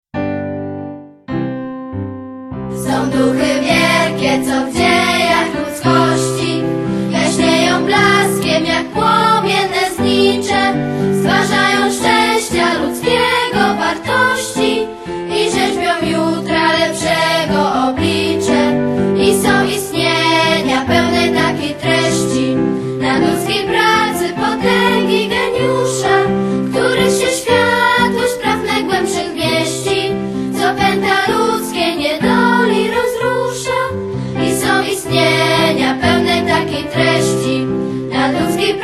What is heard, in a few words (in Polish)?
Hymn wokal